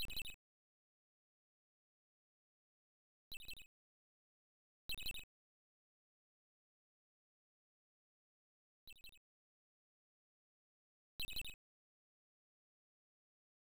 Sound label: Noise (Sparrow); PC-98; gamerip; Power Slave